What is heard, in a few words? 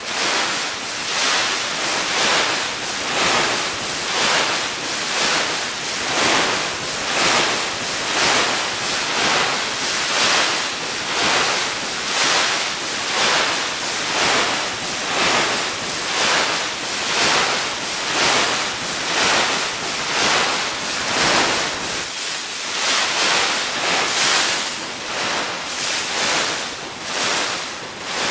sea shore